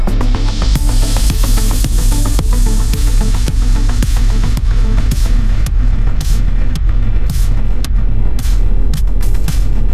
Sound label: retro 80s dark synthwave; ai